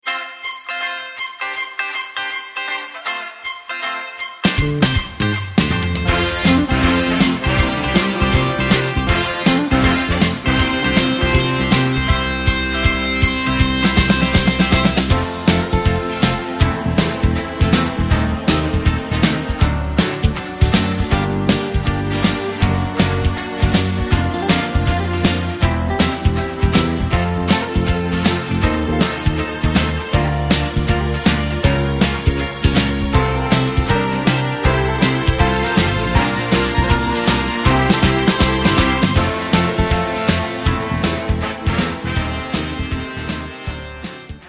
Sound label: F No Backing Vocals, backing tracks